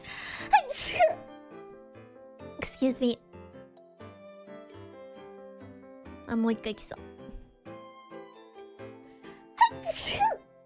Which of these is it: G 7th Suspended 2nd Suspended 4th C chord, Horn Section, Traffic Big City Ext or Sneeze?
Sneeze